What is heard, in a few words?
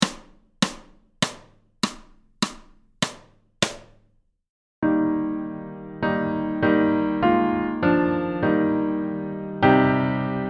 Snare, accomp; Fairest Lord Jesus